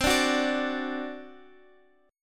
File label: C Suspended 2nd Flat 5th C down Guitar Half Step Down